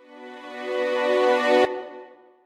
Strings rev

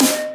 electronic; osu

